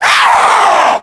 big whine; ghouls forest2; bigzombo

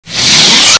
lightsaber holster

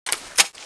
snakegun clip out

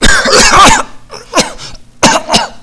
choke

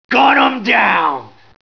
attack; payned

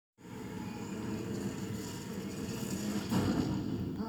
Monster

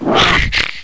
zombaron attack

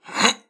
Swing; doctor

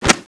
ironfan slash, weapons

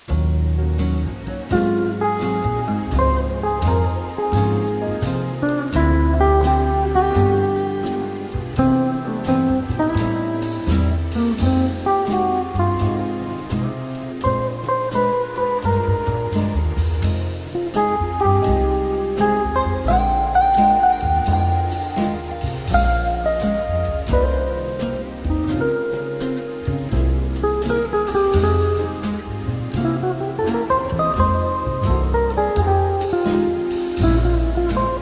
elevator lounge

